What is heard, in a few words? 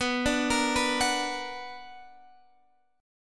B Minor Major 7th, Guitar, Standard; arpeggiated; JGuitar